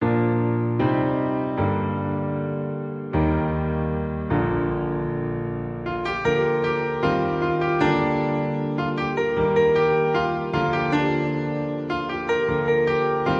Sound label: Piano Cover